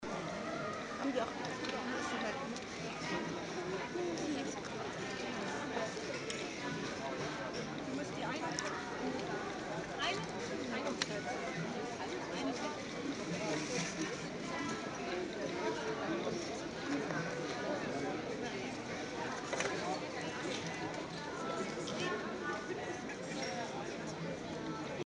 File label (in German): spritzenplatz